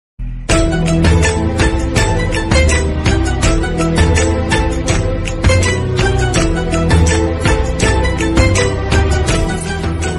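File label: love song background music ringtone love ringtone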